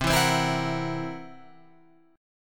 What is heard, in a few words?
C 7th Flat 5th C down Guitar Open F; C7b5 strummed; JGuitar